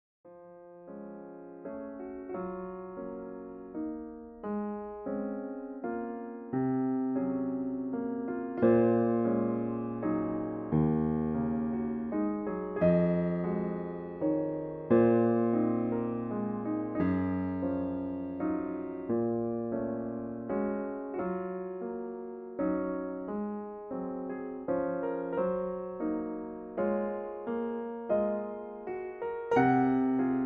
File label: Bittersweet Waltz